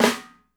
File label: FLAM, 50S JAZZ; Northstar - New Gold VOL-1